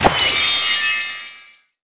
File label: light arrow; BYOND